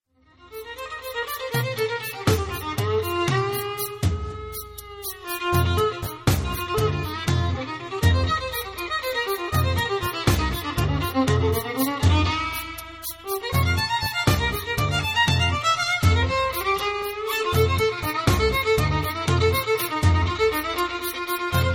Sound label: Regga Ragga